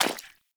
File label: Footstep Default; Pirate; Warframe